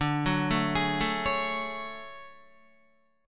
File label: D Major Sharp 11th, down Guitar; arpeggiated; JGuitar